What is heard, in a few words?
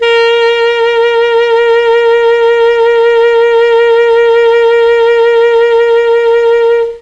A#2 ALTO SAX, Mellotron; Mike Pinder - Mellotron Archive CD; CHMB ALTOSAX